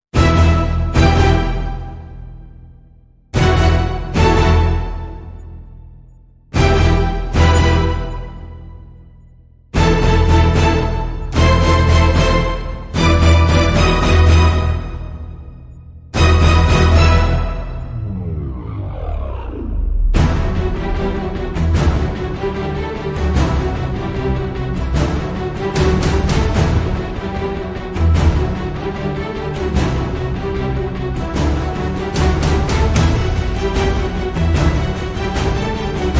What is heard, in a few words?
No Choir; Brand X Music